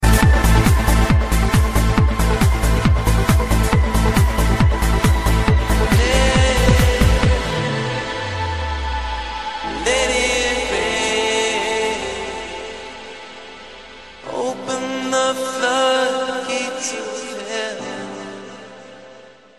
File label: Trance